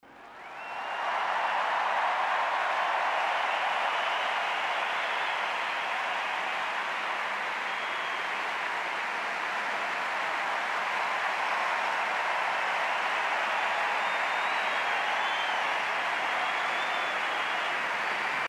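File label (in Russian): ровный шум толпы на стадионе во время олимпиады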